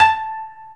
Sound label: KOTO A4, Asian Traditions; USB Soundscan vol.60 - Asian Traditions; AKAI